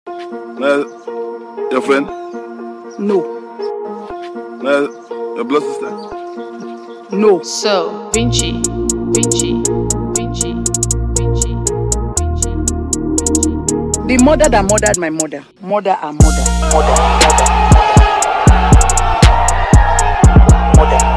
Trap Remix

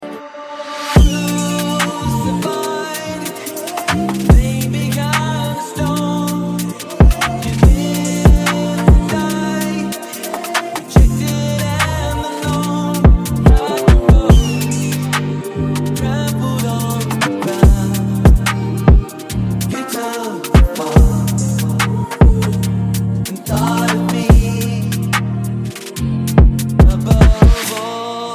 Drill Version

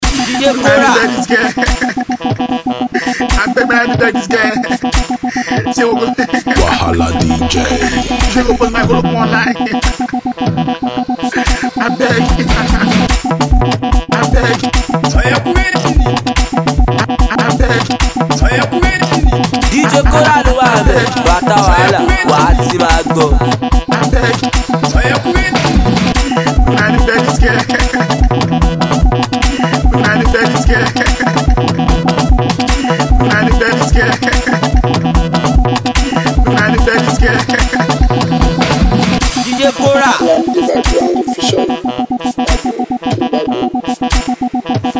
Cruise Beat

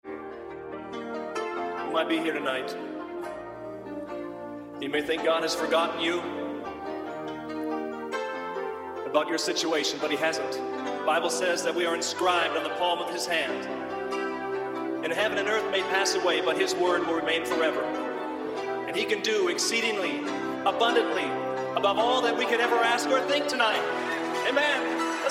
Drill Version

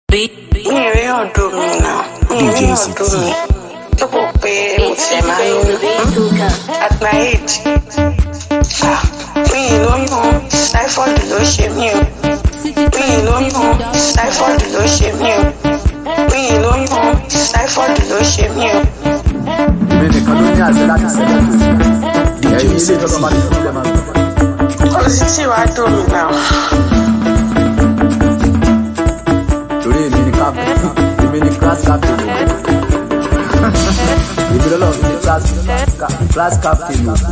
Beat Instrumental

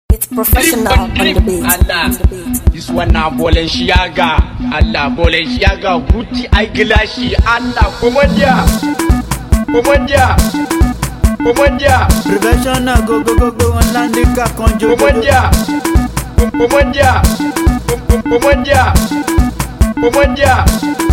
Beat

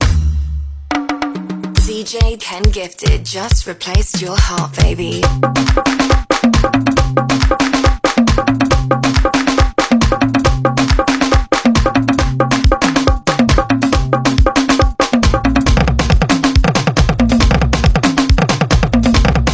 Drum Riddim

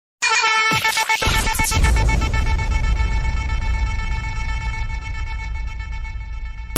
DJ Effect

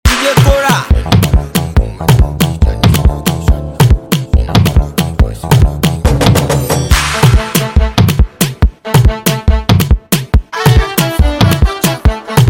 Flute Version

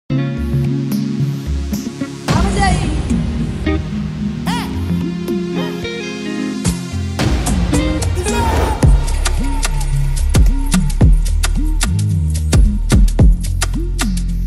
Instrumental

